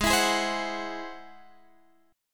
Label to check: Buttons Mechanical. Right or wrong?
Wrong